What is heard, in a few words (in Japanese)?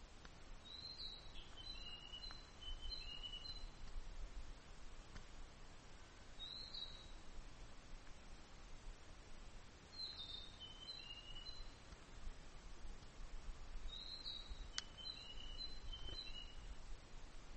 kibitaki